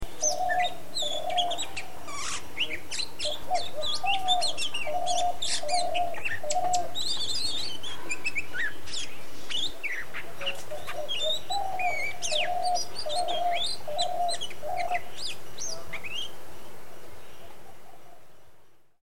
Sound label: Magpie robin